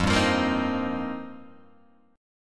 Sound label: F 7th Suspended 2nd Suspended 4th, Guitar Open G